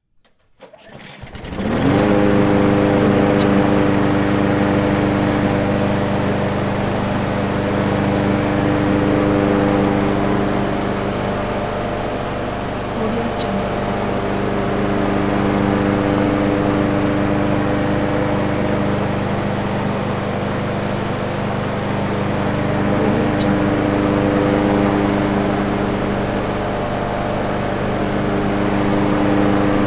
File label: lawn mower motor